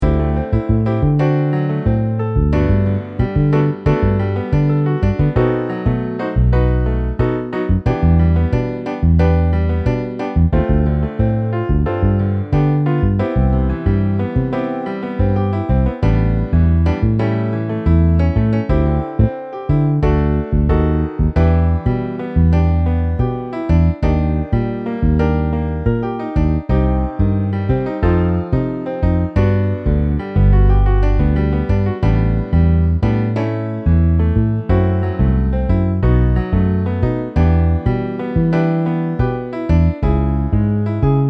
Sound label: Piano Bass MIDI